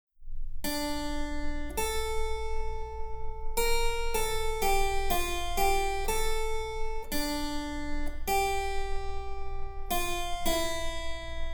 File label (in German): Cembalo; Mark Lindley WTC 1